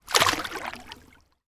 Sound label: water splash or movement